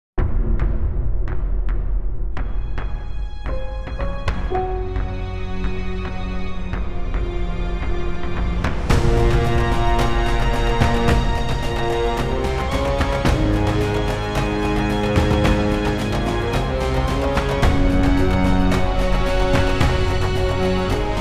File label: CINEMATIC NATURE